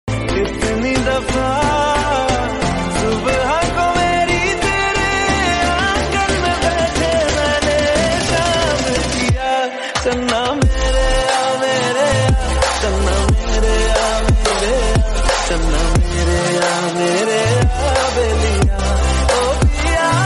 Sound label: sad ringtone hindi ringtone